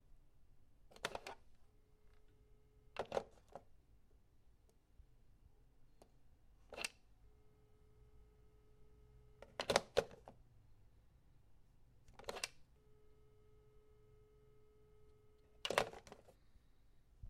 vzyat i povesit plastikovij telefon

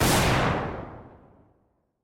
shumnij gromkij odinochnij vistrel

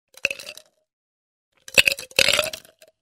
kidayut led v plastikovij stakan

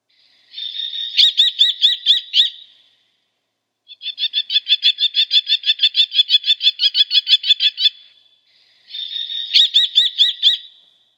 pustelga obiknovennaya falco tinnunculus

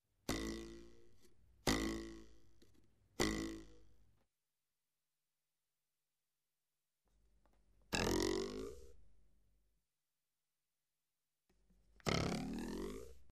drebezzhanie chego-to

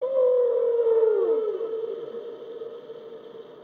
silnoe eho ot krika kasatki

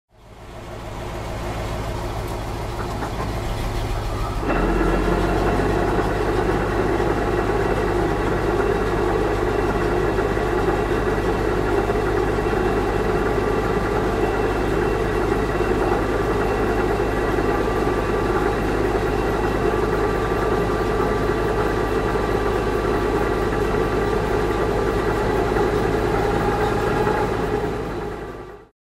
vklyuchenie nasosa pozharnoj mashini

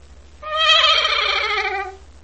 skrip odinochnii zhivotnoe

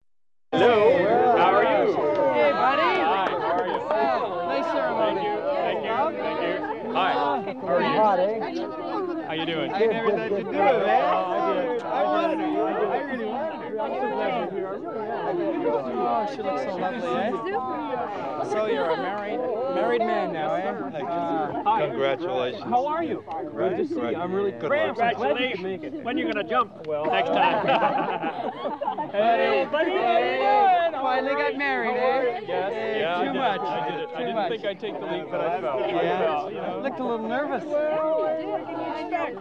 tolpa na svadbe pribitie gostej

